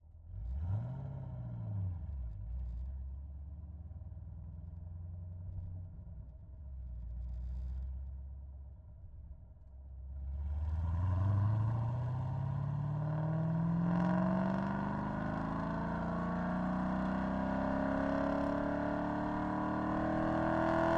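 Camaro ZL1 Onboard Drive Ramps Stop Off Exhaust Center; 2014; DPA4062; Pole Position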